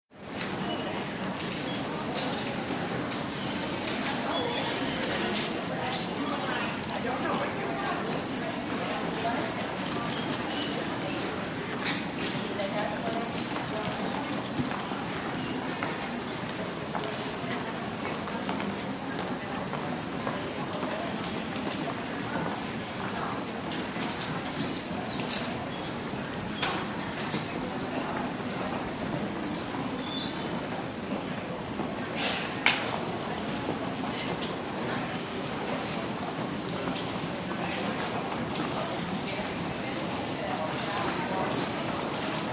CROWD -STORES AND HALLS DEPT STORE: dress department.; Macy`s